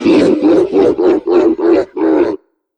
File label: Troll Monster Laugh